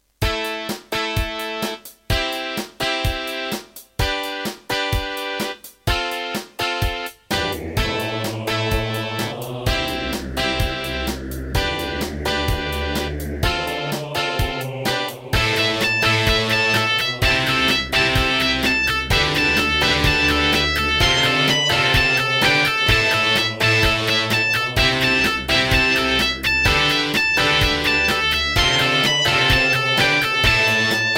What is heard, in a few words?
Wave 1 [MP32L GeneralUser GS Bank]; Serdaco; raptor call of the shadows